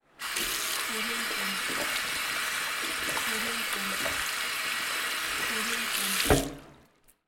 cleaning hands

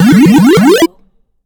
super jump up

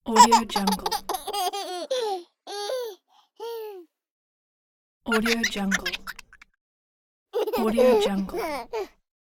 baby laugh

